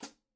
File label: hi hat 14 inch pedal